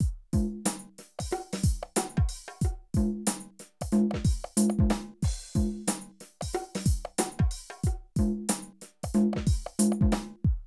Drum loops, Streetsoul

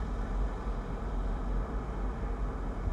Ambience Loop, outdoor

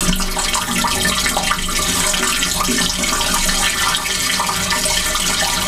WET VENT PI, Sanitation Pipes; SFX

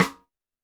Snare, Roland TD-20

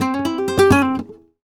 Flamenco Guitar Remates De Pulgar, c

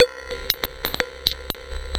Structured Rhythm, IDM Rhythms

